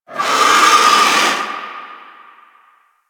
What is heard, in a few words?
Vulture; Ambience; One Offs; Birds; Warframe; Quests; The New War; Drifter Camp